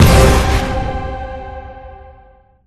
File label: Fuse Levelup; Spider-Man Unlimited; Android